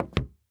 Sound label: Foley Footstep Hard Sole Dress Shoe Single Step Down On Hollow Wood Surface; Foley Footsteps